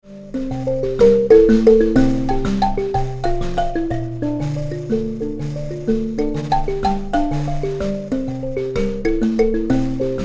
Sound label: mbira